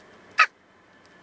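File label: hooded crow corvus cornix cornix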